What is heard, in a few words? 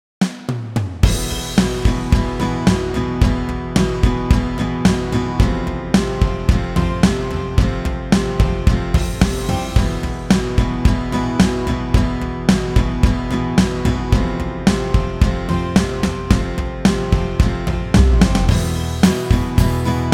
Phrygian Slow